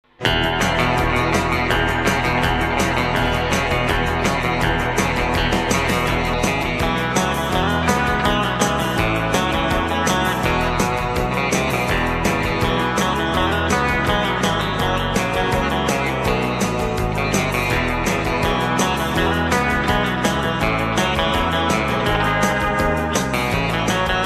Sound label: happy go lucky guitar